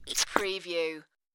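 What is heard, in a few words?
Throat Slit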